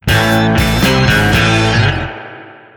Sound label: Sting Finish (A)